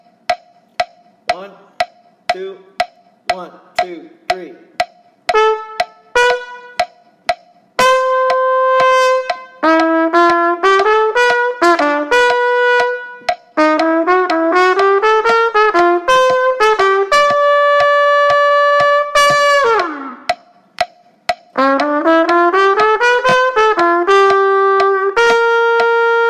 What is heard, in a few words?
Shuff, qn=120